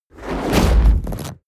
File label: fire whoosh experimental small